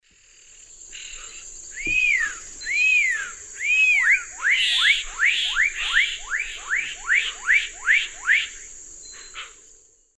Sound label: Donacobius atricapilla, calls and duet; S. America; Eastern Lowlands